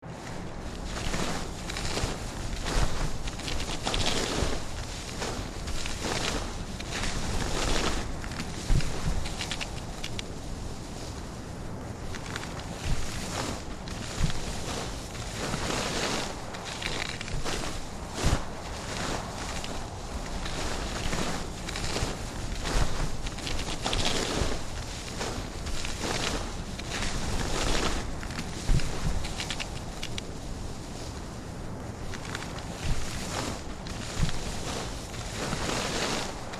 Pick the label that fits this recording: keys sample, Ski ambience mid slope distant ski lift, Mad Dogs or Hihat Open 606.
Ski ambience mid slope distant ski lift